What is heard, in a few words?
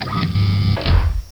door